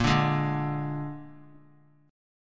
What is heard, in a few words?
Bb 7th Bb down 3C,3F,3A,3D; strummed; JGuitar